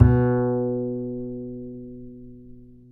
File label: B 3, Bass; Spectrasonics; Bass Legends